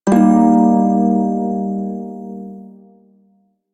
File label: Prime Shawzin Blues Chord H; Music; Warframe